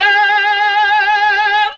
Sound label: "aohw", Voice